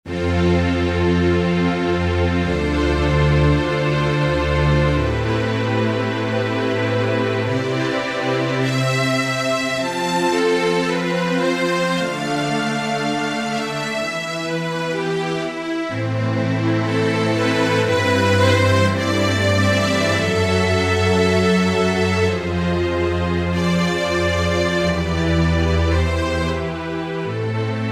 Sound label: Stereo Strings; Korg TR-Rack